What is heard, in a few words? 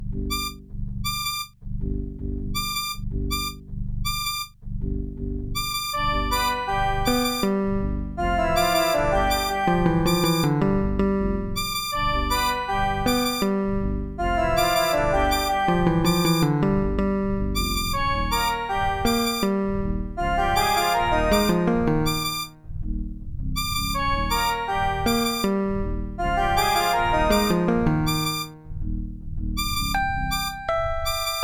echo rock song; The Music Studio